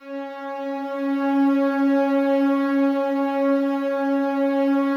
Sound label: slow strings C#3, Yamaha VP-1; 90 s Sample CDs; Music Store